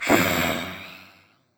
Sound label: Skel Soldier Strike Vo